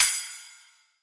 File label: cymbal smaller